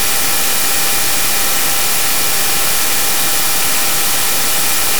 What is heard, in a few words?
cell phone